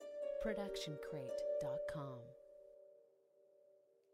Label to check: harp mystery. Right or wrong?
Right